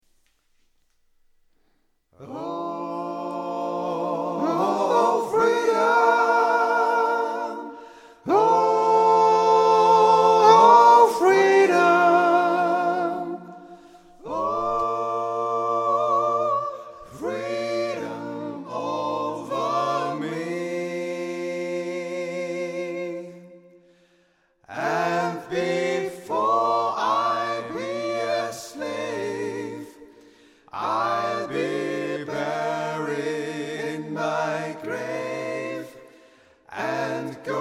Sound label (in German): Sopran